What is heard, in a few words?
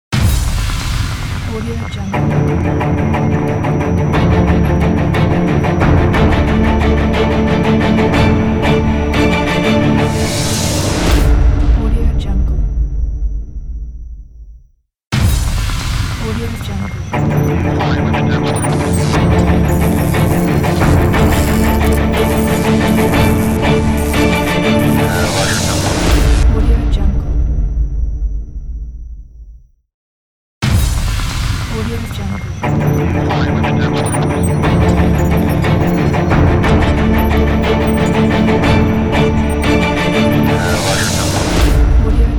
epic hybrid logo; amethistlab